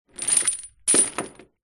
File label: industrial chain large set down on hard floor; zapsplat